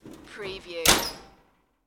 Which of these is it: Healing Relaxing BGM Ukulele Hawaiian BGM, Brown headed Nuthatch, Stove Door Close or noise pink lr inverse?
Stove Door Close